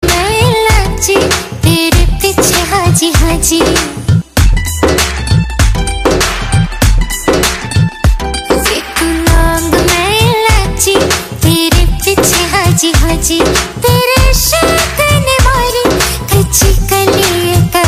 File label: Instrumental Ringtone; Luka Chuppi